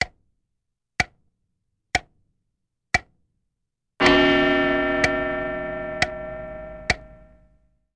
CLOCK; CLIPART

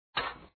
Step L

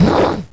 Poof Deep Vox; JONAH

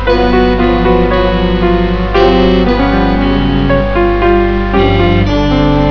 Serial, Music Samples; Symbolic Composer; S-COM Music Samples

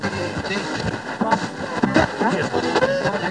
radio

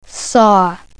saw

